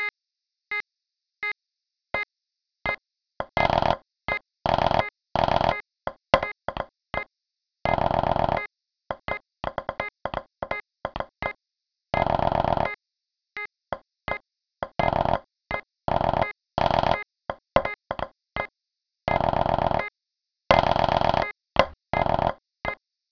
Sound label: Snare - Basic 84bpm, Drums